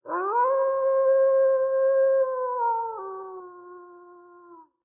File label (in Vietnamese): tieng cho soi hu dai